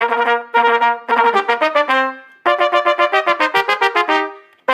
Trompetes

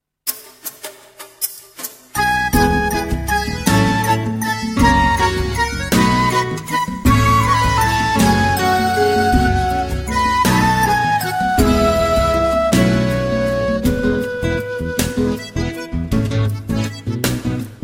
Canco de la princesa versio instrumental

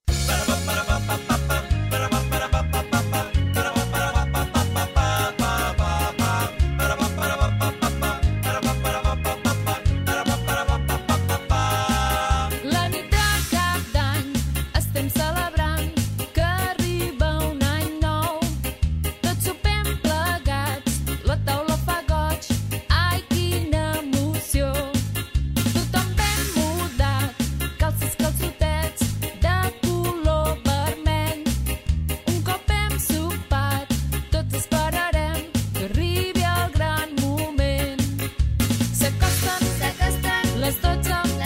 LES CAMPANADES; assaig nadala 4t

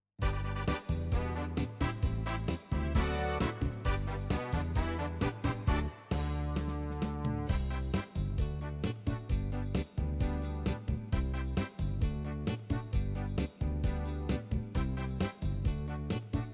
BASE INSTRUM; LA CASTANYERA CANSADA

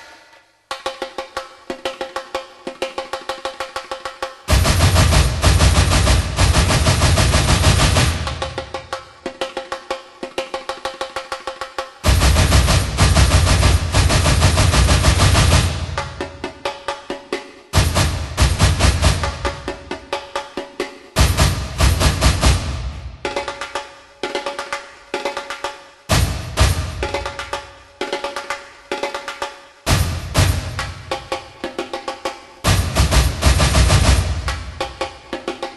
Batucada Brasileira

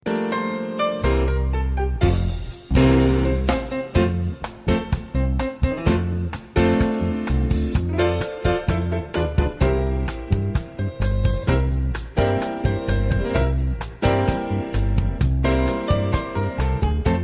Coronavirus Vella Quaresma instr

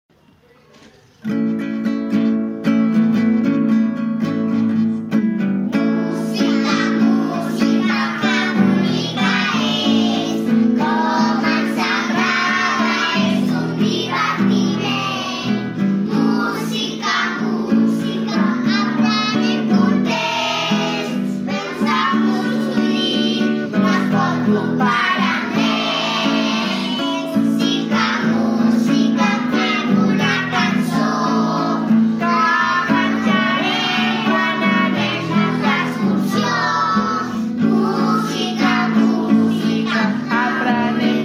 música música

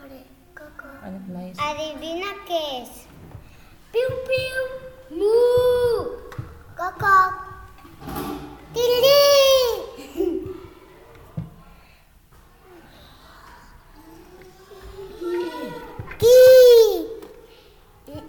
SONS ANIMALS

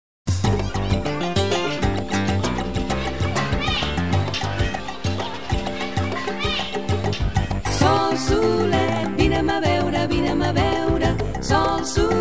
sol solet

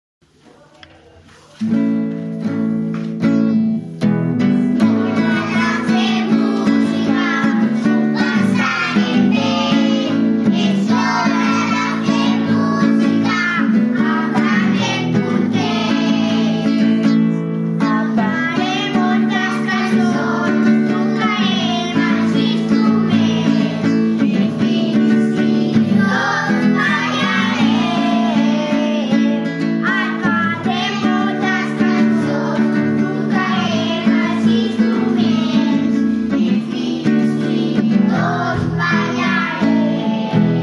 Hora de fer música

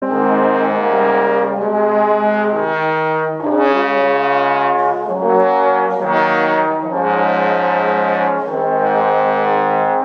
trombons fragment

